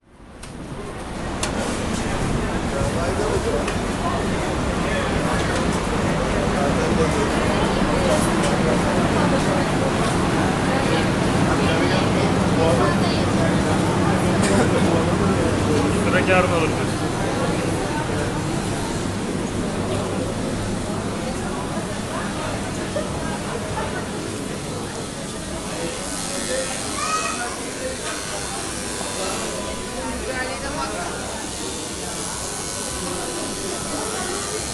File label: istanbul egyptian bazaar spices market crowd voices interior ambience short; fvtt sonniss gdc audio